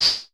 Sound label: HH, Vermona Mono15 Drums